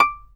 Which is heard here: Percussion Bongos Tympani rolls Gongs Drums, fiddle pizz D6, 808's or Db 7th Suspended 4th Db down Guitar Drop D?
fiddle pizz D6